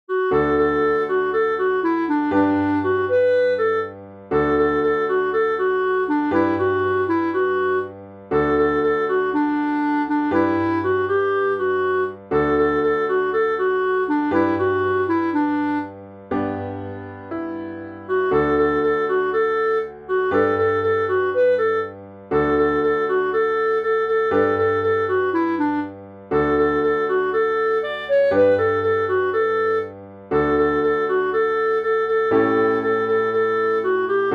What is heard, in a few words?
instrumental